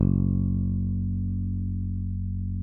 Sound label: SPEC SUSTAIN, Bass